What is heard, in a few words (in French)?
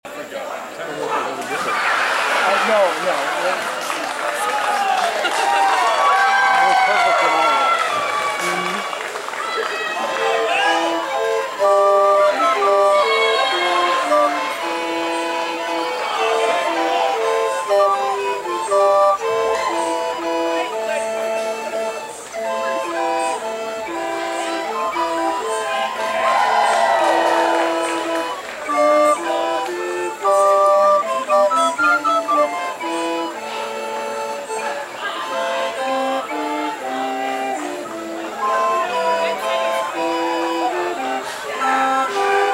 flutes a bec